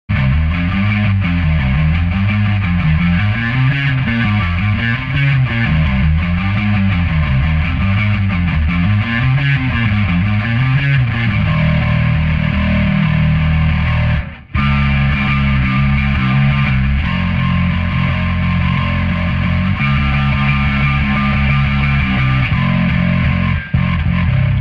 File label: bass mix processed fx